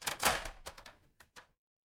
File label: door lock